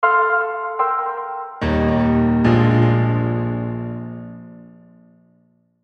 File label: Musical Grand Piano Chords Reverb, Chord F Minor Add 9; Pianos; Blastwave; Sound Effects:Musical